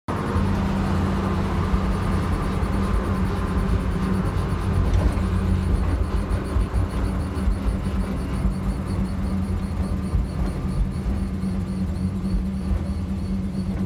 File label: brake squeak squeal; suspension brakes